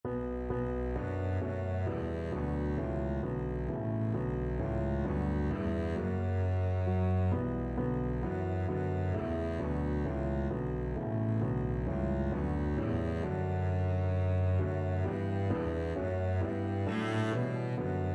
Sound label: god rest, Double Bass